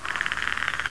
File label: bug; predator